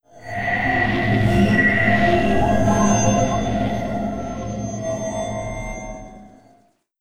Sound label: reveal